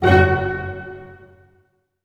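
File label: staccato F#, orchestra; Sound On Sound - Peter Siedleczek's Orchestra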